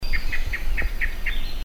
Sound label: Island Thrush Alarm